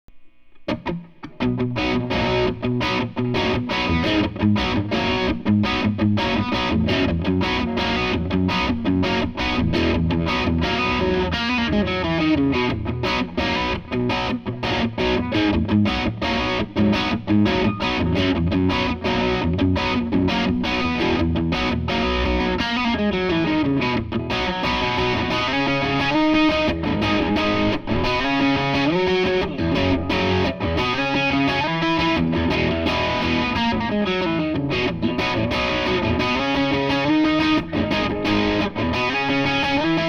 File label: strat drive; egnater